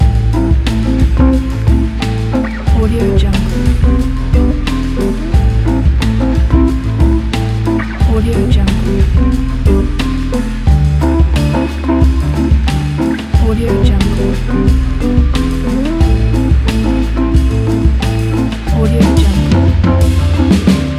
jazz lounge logo

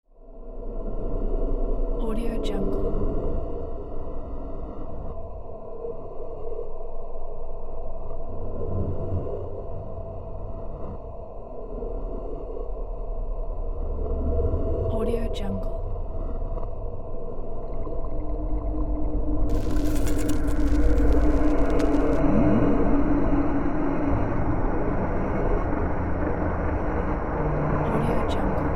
underwater cinematic background

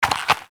replace hand gun into holster

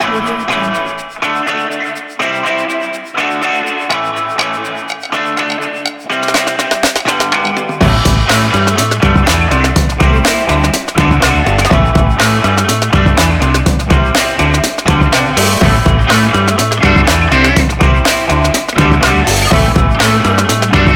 breakbeat funk action

